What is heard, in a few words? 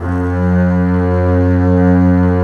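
bass F#2; Digital Sound Factory Virtuoso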